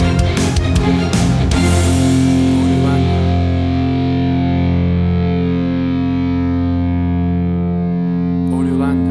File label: Big Punk Rock Orchestrations Stinger